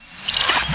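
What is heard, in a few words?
dark explosion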